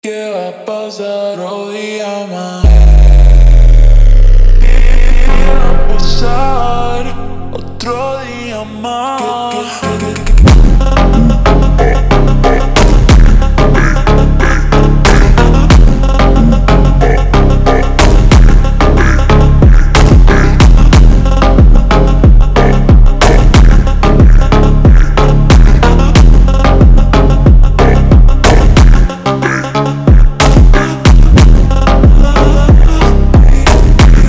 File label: Ultra Slow